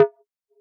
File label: mod hit, Mod Drums; neuro; Sinistarr